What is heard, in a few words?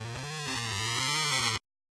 Gtr